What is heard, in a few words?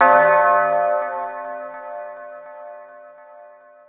church bell